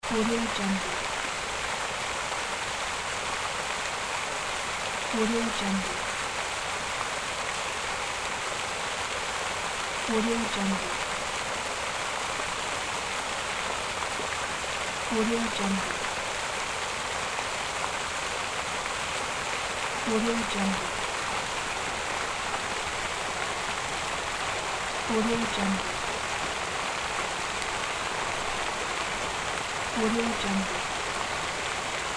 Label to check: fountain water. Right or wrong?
Right